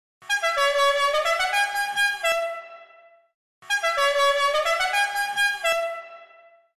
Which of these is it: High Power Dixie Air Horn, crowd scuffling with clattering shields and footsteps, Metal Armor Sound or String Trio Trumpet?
High Power Dixie Air Horn